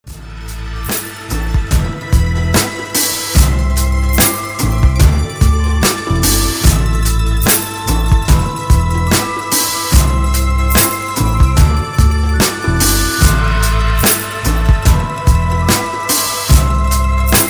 instrumental

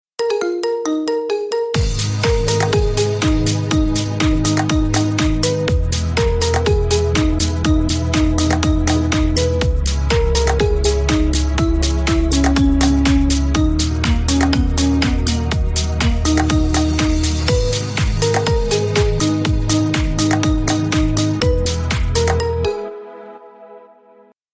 marimba remix

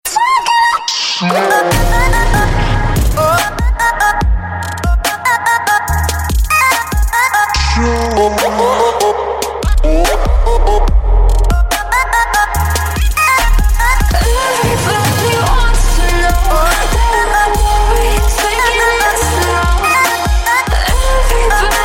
slow

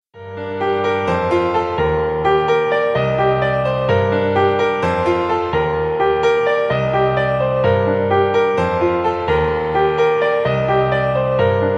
piano cover

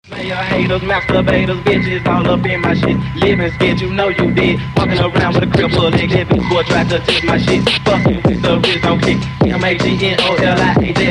cassette version

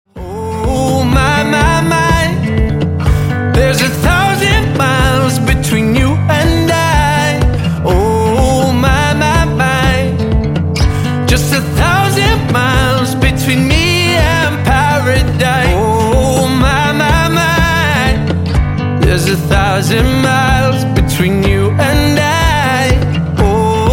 acoustic

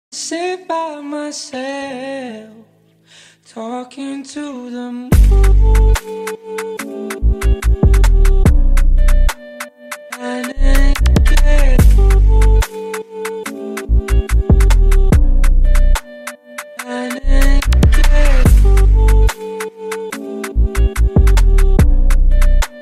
instrumental

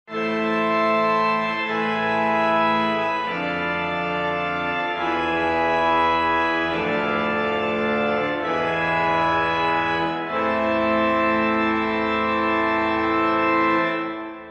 defeat halloween; overwatch

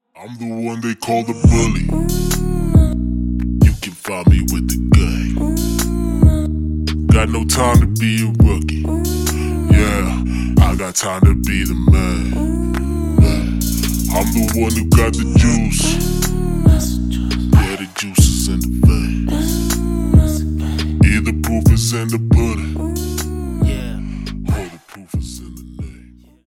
beats

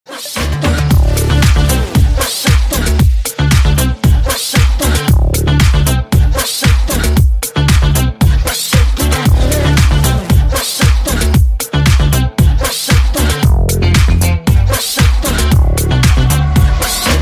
remix

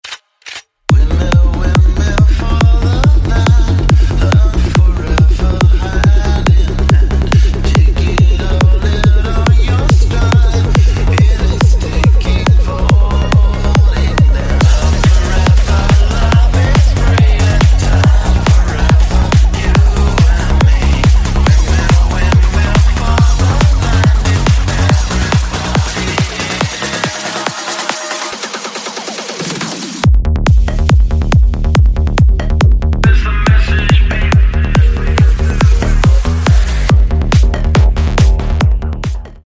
remix

